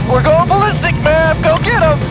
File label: balistic